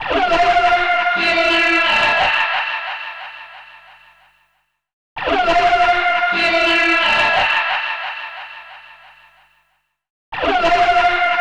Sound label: Loud Speaker Chant, Guitars; Zero-G Smoke